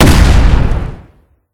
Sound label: mag hit, explodium gun